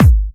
Bassdrums UK Trance Kicks

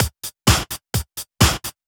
Housebeats, 128BPM; Loops; Vengeance Samples

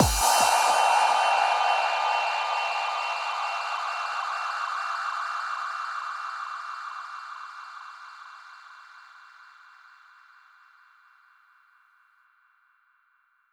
138 BPM Impact; Sound FX; Bminor

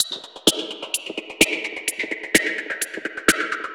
Electro Loop 128BPM; Vengeance Samples

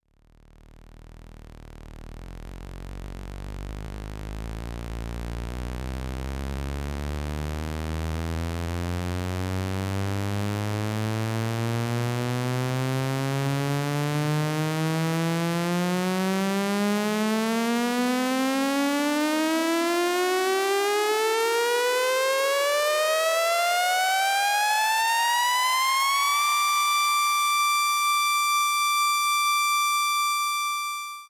SweepFx Clean 138BPM; Sound FX; Gminor

